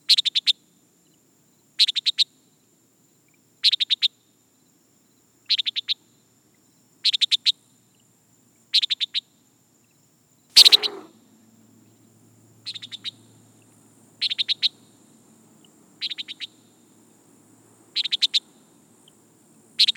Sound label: Antillean Nighthawk